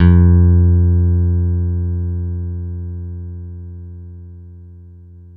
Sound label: PX PICK F 2, Bass; Masterbits CLIMAX 7; PX BASSES